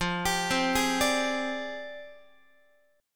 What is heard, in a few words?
F Minor 6th Add 9th, Guitar; Fm6add9 arpeggiated; 4A,4E,5A,5D,5G#,6C#; x,13,10,10,12,13; JGuitar